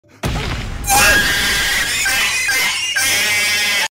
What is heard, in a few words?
hardest laugh